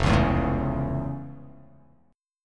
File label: F Augmented Major 7th, chord; F+M7; strummed